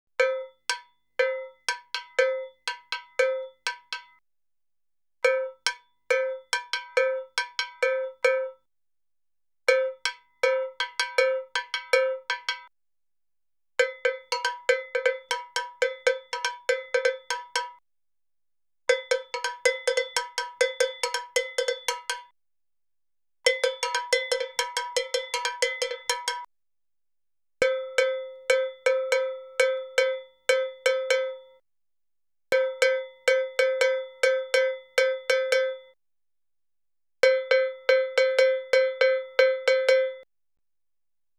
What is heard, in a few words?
Salsa Picante; Masterbits; World Zone VOL-3